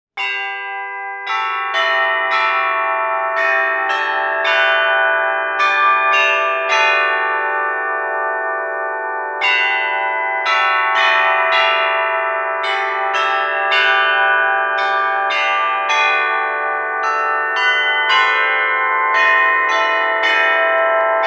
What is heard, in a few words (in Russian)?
zvonnica